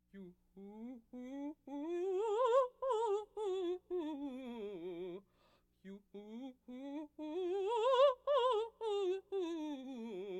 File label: Laugh tracks